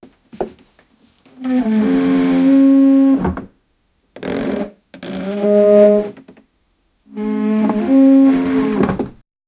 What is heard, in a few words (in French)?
chaise en bois qui se, chair